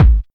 short kickdrum sound g sharp key, Royality free kick single shot tuned to the G# note; kick drum samples